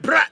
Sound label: brrrat, Voice; ooh ahh